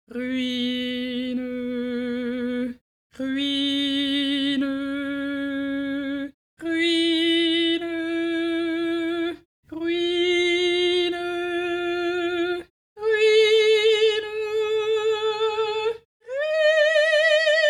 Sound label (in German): ruine 7 toene